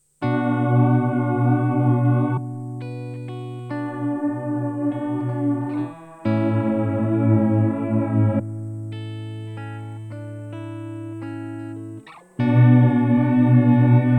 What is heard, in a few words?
Freeze Fast Fade Vibrato; Chase Bliss Onward